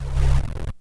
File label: zap; electrical sparks